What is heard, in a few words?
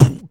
Beatbox Kick, Human Beatbox